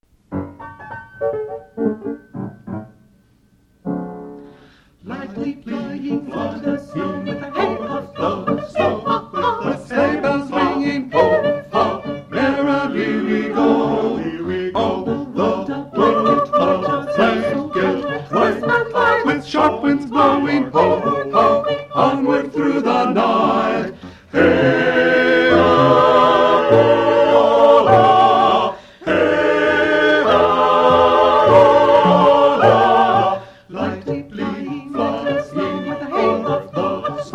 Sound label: The Sleigh Tenor, Choir